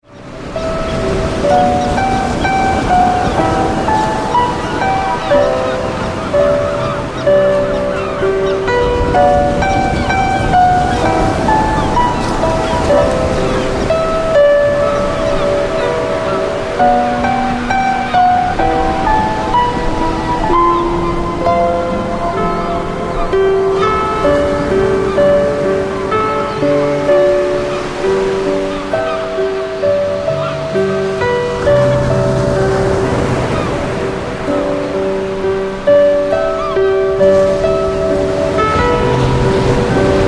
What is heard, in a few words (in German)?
Entspannungsmusik